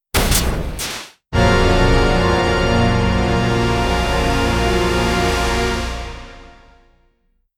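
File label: fast paced dramatic; authoritative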